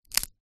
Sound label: food rice cracker snap